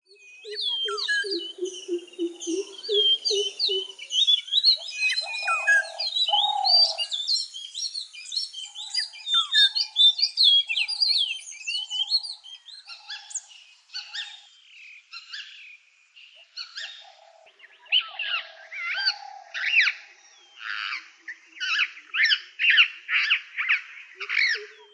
Birds in town gardens

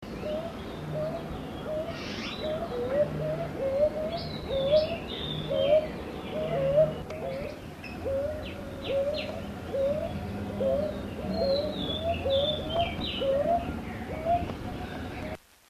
Brown Hawk Owl one bird pair; Sri Lanka

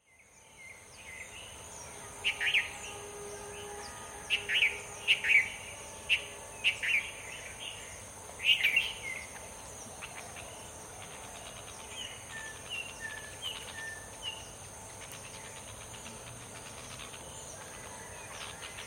Bulbuls; ZOOM

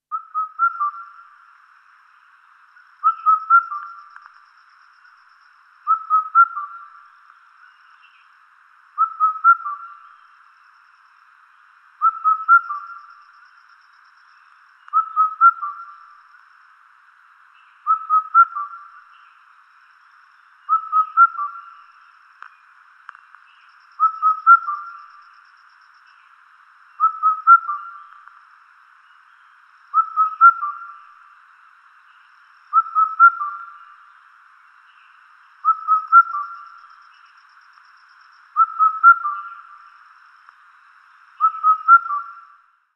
Indian Cuckoo; Sri Lanka

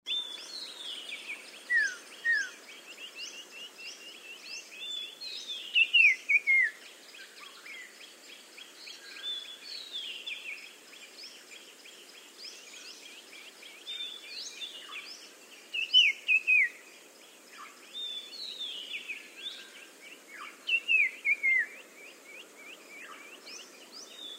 Yala Block III Galge, sri lanka nature sounds; Animals heard in the soundscapes

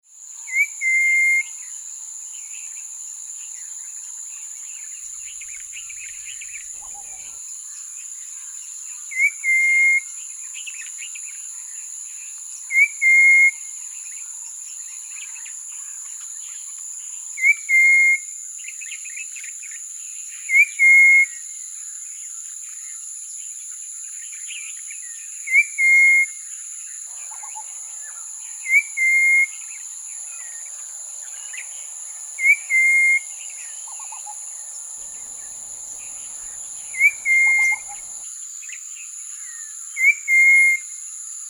Common Iora

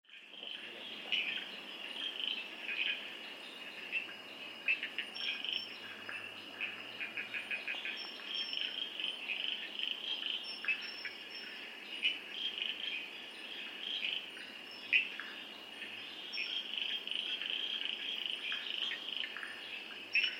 Sinharaja night soundscape; Zoom XY st; Sri Lanka Wildlife Sound Recording – The locations

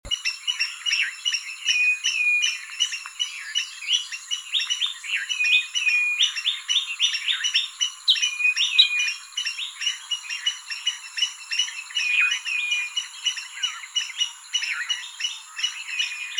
birds squirrel

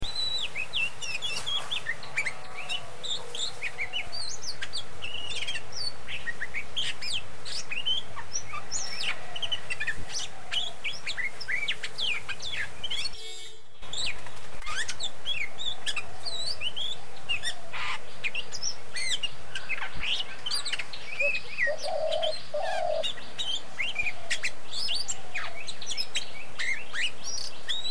Magpie robin

